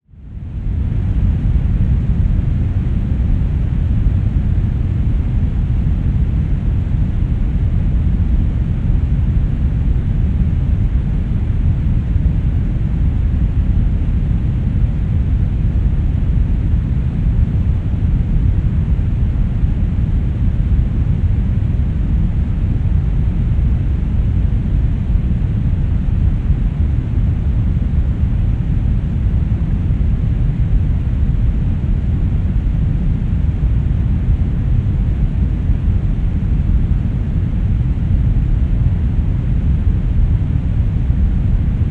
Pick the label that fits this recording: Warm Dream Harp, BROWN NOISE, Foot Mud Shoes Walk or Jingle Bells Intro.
BROWN NOISE